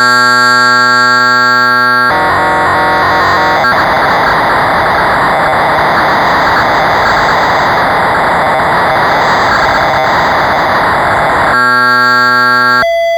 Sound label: poly 800 patches, synth